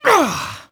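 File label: voice pull loose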